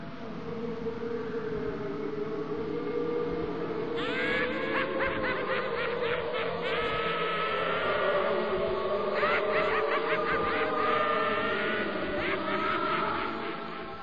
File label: haunted manor